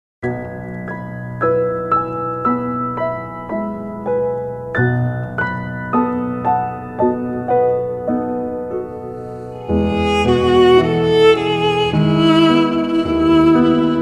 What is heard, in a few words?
Violin Piano; Arroro Mi Nino